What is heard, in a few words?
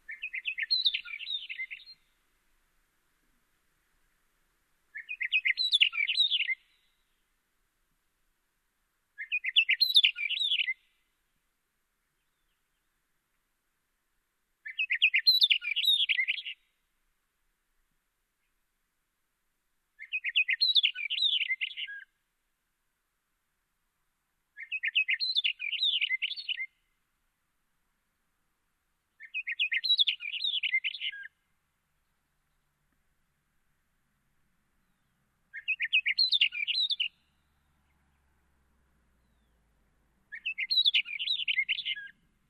Hume's wheatear